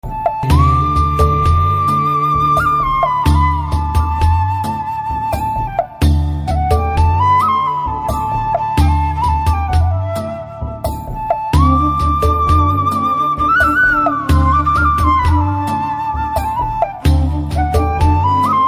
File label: BG Flute Ringtone